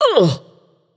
surprised; babayaga; smite

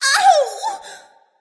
ouch; smite; aphrodite

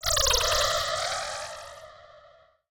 Recall Spin; smite; god cupid skin13

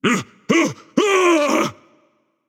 fear; smite; arthur

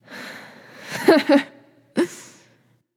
hehheh; smite; scylla

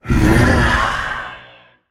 breath, voicepack; smite; jorm

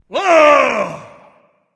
battle cry; smite; odin

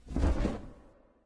wingflap; smite; thanatos

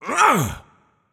grunt jump; chaac

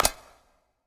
clap; smite; ahpuch skin10

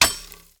weapon stab ground; Smite; Sobek

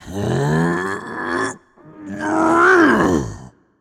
grunt lift; chaac

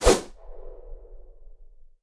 wall of spears; odin; smite

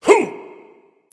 grunt jump; Smite; ymir skin9

